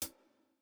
hall resonance hihat

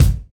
kick, house

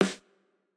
Jrock snare

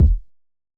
Hiphop kick; Reverb+EQ+Comp+Limit

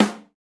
snare, snare house

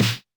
snare; Electro; futurefunk

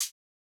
Modern hihat, Electro

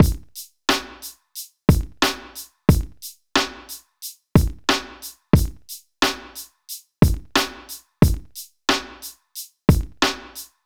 90s hiphop loop 90; Reference beat bpm90

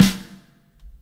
Rock snare; Reference Beat

